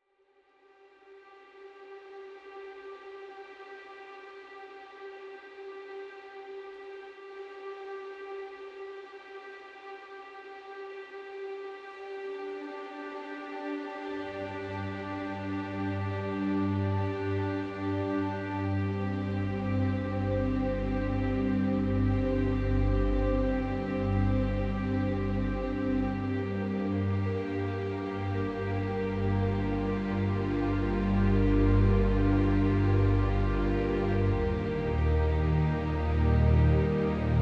Lament Underscore, Piano Noir; Narrative Mind

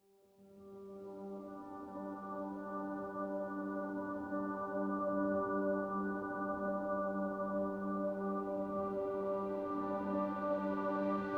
Lament, Piano Noir; Narrative Mind

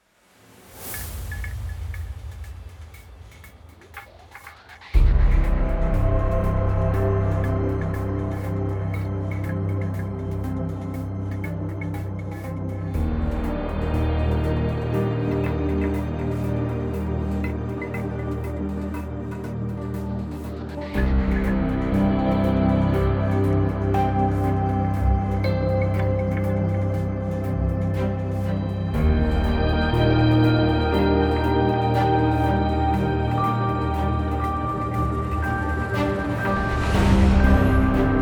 Stream Underscore, Percussive Motion

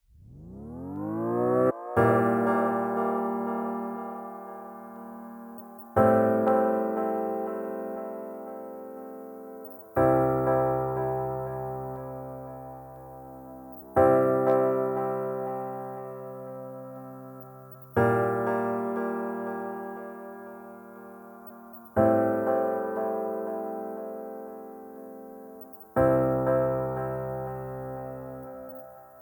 Recharge Underscore, Percussive Motion; Narrative Mind

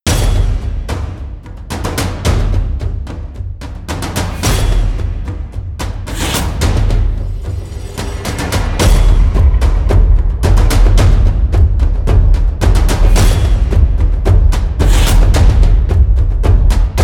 Next Chapter Underscore, Percussive Drama; Narrative Mind

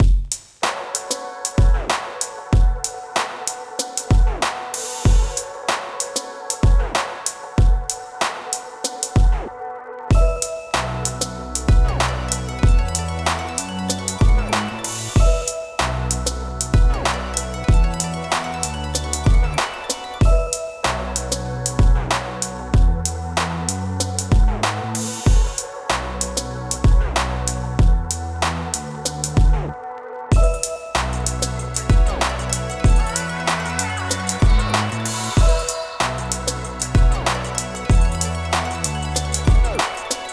Hip Hop Delight, Old School Chronicles; Narrative Mind

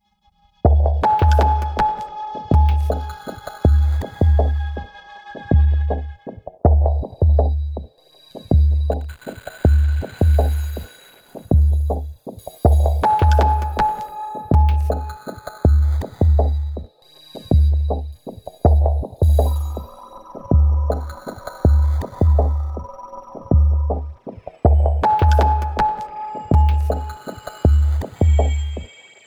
A Swirling Web Underscore, In Suspense

